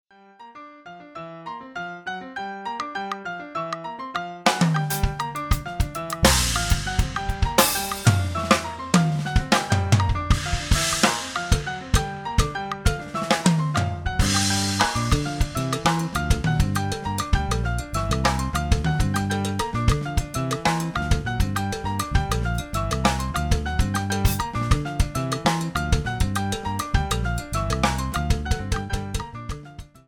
Tumbao And Rumba Clave sample

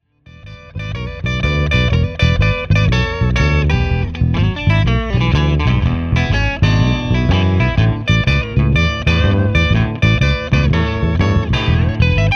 shuffling along

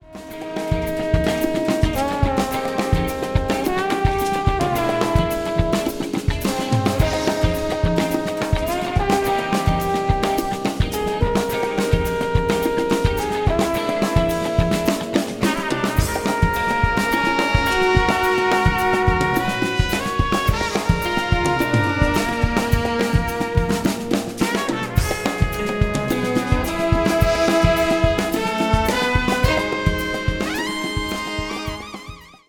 Bass sample

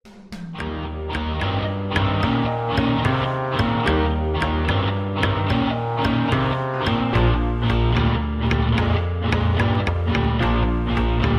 Slow Blues 128

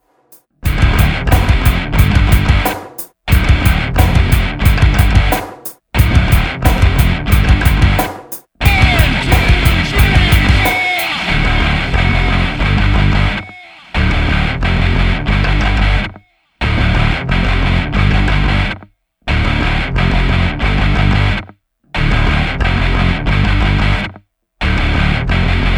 Metal ish Sample

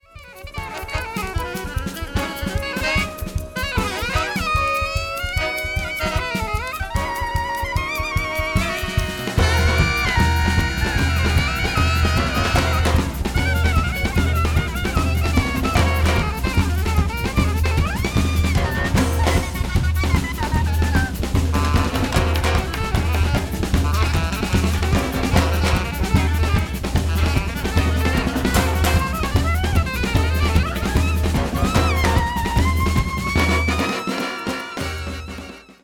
Bass

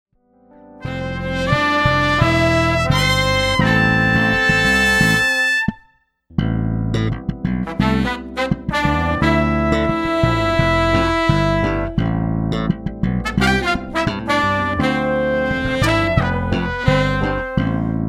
Dead Saxy Sample

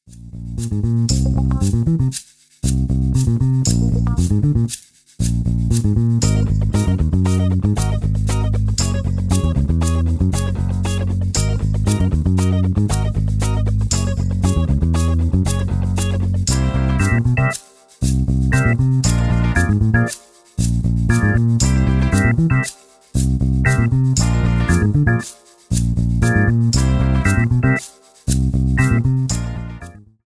Funk

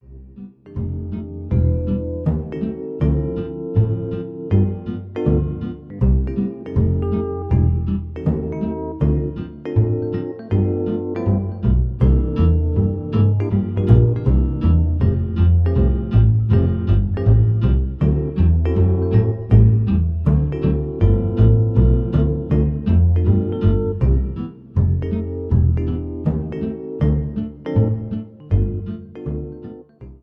Medium Up Swing

